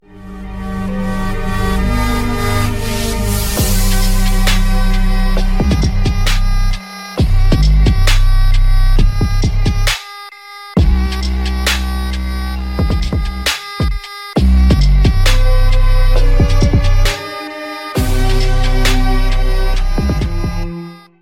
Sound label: English ringtone; Sucide Squad